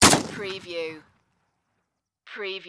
Shotgun 12 Gauge Saiga 12 Combat Shotgun Single Shot Distant Perspective